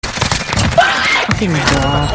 screeeeeeaaaaaammmmmmm

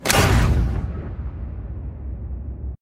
finger snap; gauntlet

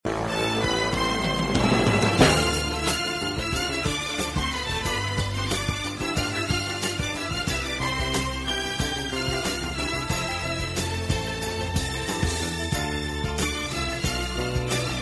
fanfare; rocky

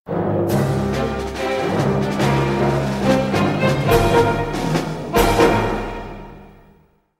stage intro adventure music; super smash bros melee

